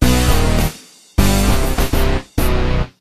Mission Failed; Gangstar Rio City of Saints

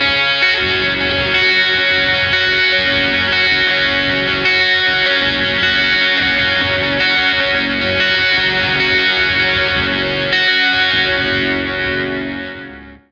Lake Hi Gtr G flat long

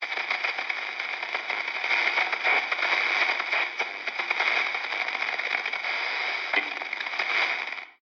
Radio Music; Radio Music Bank

